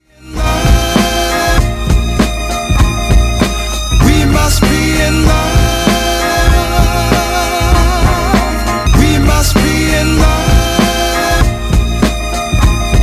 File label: Instrumental